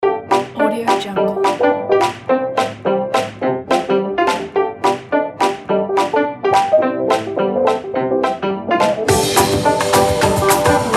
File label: swinging; sunsmilestudio